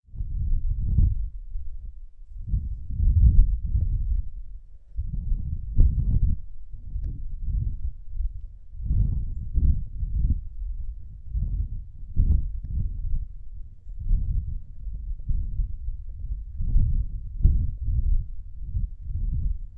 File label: rumbling wind